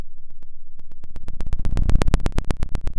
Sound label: Sound of a fire crackling